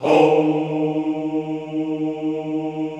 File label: HOUUU, Classical Choir; Best Service; Extended Classical Choir